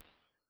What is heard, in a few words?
Chambers and Large Rooms